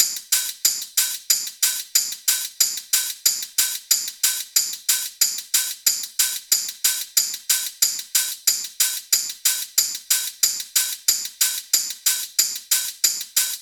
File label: hat 92, Drum Loops, Hat Loops; SD MIDNIGHT Sample Pack; Slate